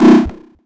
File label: Old Reactor